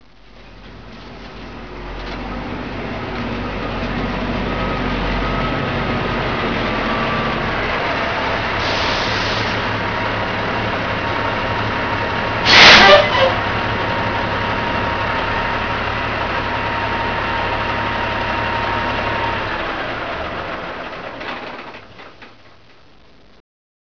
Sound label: truck